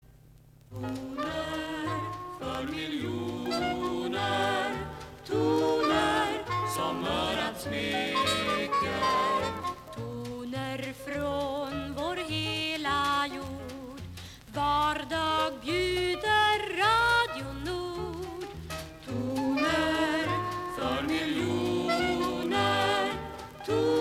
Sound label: jingle; R Nord